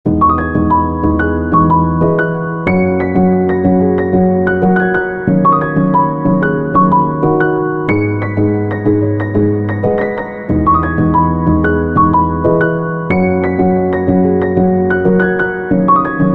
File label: Loop Cinematic Soft Piano